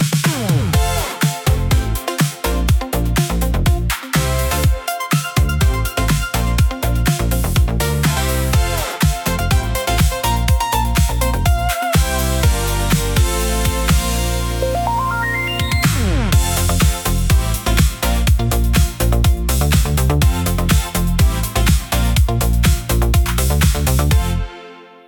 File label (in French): Synth pop annees 80 moderne; identite sonore ia